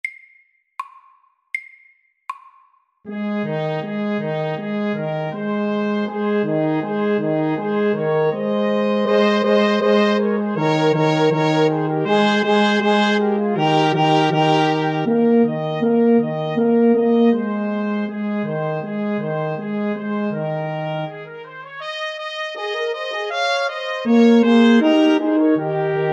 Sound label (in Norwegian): Fanfare MIDDELS